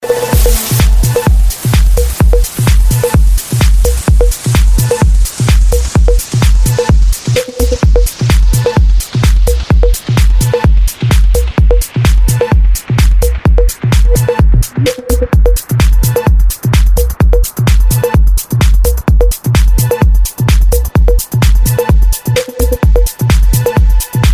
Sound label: dj vibes ringtone